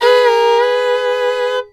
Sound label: FIDDLE FX; Sampleheads; Whole Lotta Country 1 & 2